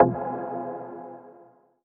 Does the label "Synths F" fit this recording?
Yes